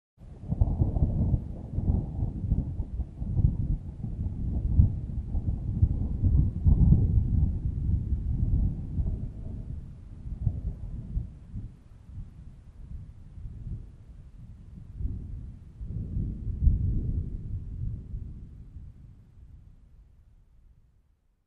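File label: Thunder Long Gentle, distant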